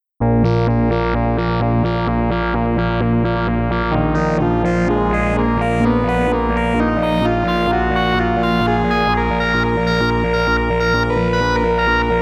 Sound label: a phase shaper sequence